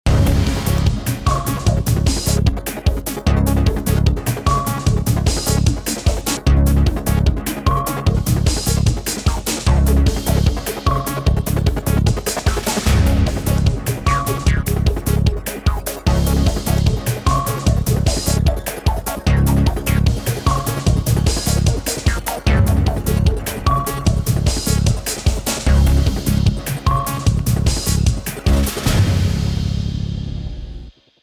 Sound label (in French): Agent secret jingle; Jingles medley